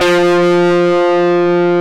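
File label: F2 distortion guitar; AKAI S-Series CD-ROM Sound Library VOL-8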